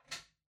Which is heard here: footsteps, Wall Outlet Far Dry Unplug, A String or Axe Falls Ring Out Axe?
Wall Outlet Far Dry Unplug